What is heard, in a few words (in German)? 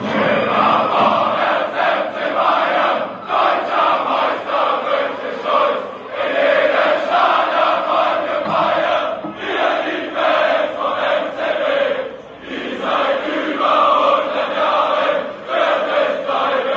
immer vorwarts fc bayern